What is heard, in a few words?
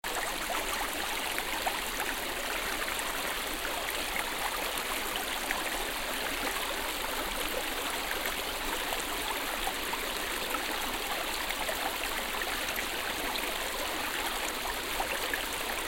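river mouth water flows in river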